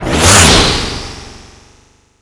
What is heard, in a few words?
Vay Hek Drone Take Off; Warframe